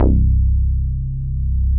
moog; basses

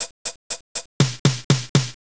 Drum Song; Mythos

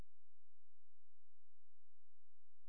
silence

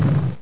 wall hit; landslor

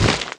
impact; RallyC

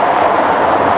skid sand; RedLine demo

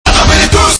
empty recycle bin; Pokemon

